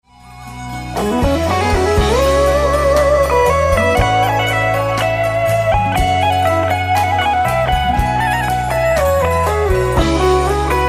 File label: jk solo